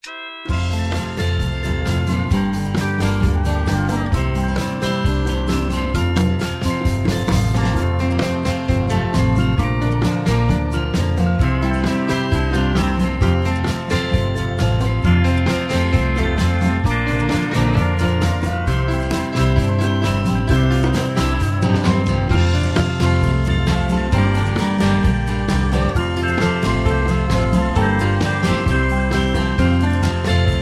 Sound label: Low Key